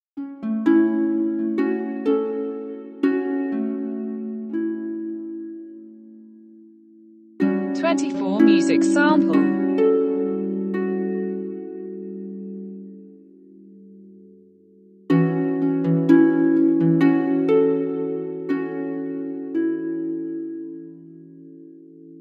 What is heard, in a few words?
sunflowers in the rain harp